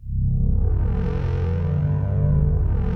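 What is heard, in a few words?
FILM MUSIC C1, PROPHET VS; Greytsounds VOL-1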